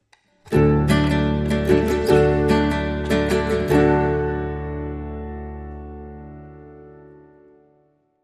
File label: Fireside Jig, Link Sting